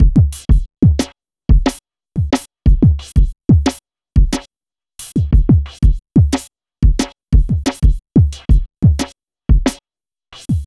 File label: jungley 090bpm, Downbeat Dance Grooves; Zero-G